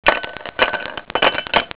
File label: ICE CUBES, KITCHEN; EFFECTS